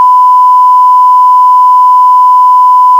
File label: 1kHz 60dB; normalized